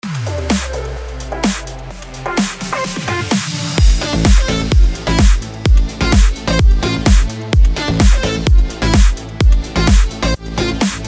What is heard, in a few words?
BED ELECTRO AMIN 128BPM